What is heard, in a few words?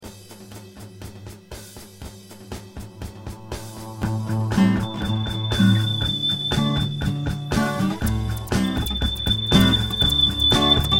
song